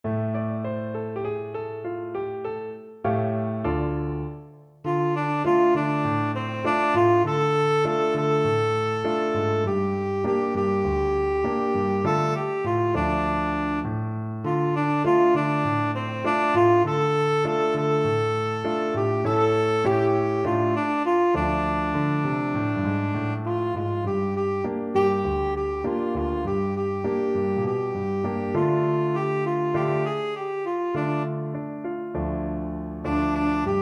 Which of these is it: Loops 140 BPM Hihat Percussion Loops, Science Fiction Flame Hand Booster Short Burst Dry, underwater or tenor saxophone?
tenor saxophone